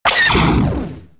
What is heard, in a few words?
tie fire, weapons; tie fighter